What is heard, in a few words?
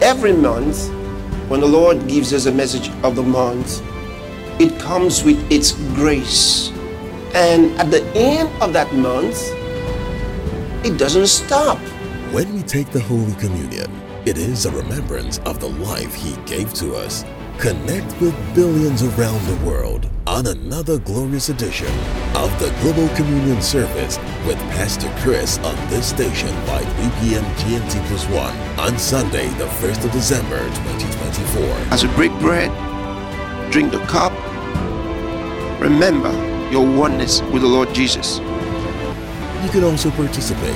JINGLES